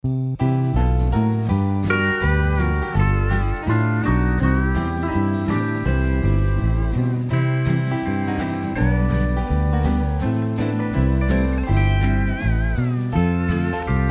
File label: ACC